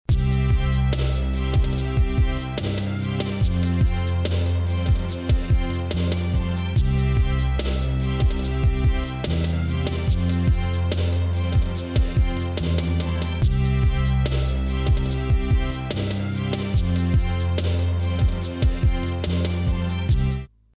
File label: Mooer Synth Drum Melody Loop Indie Pop